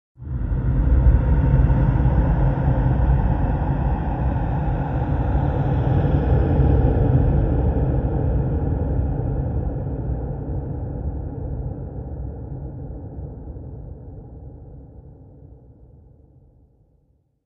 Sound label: Growl Ambiance, horror